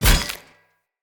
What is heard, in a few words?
Sphere Polearm Medium Impact Flesh Armour Hollow; Weapon Impacts; Player; Combat